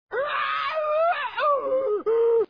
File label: whale